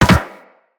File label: Land Soft Metal, Footsteps; Characters; Operator